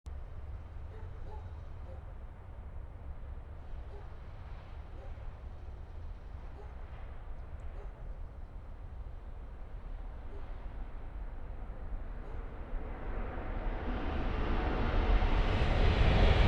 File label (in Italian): Treno; Eigenmike; ComunePR; NuovaStradaF